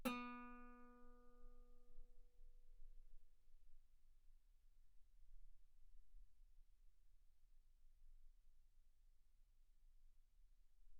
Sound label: Guitar B3; ff; sul B